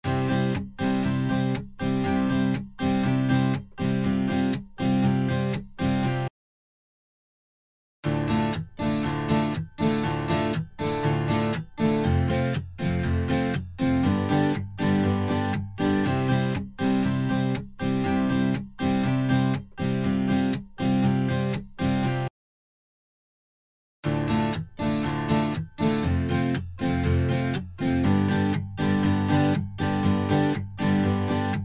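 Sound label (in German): Die Strummed Guitar Accoustic mit dem Preset „Large Guitar Hall", erst ohne, dann mit Tverb; Eventide Tverb; Native Instruments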